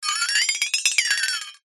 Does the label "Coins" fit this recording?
No